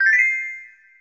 SFX Right; Banjo Kazooie

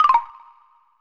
SFX Bottles' Challenge Put Back; Banjo Kazooie

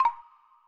Drop Puzzle Piece; Banjo-Tooie

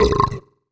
Burp; Super Nintendo; EarthBound

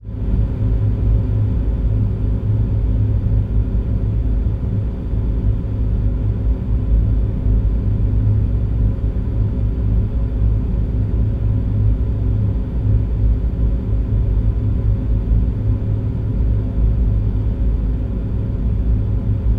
Ambient Sounds; The Subspace Emissary; Super Smash Bros. Brawl

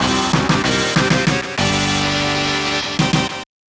Victory; Super Nintendo; Mega Man X3

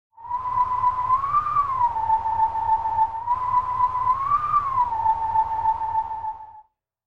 Howling Stone - (Howling Stone); Legend of Zelda, The : Twilight Princess

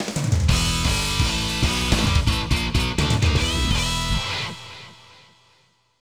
Goal Fanfare; F-Zero Series; F-Zero X

